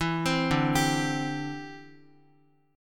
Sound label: D 7th Suspended 2nd Suspended 4th, D, down, Guitar; 4F,4A,5C,5G,5C,6E; arpeggiated; JGuitar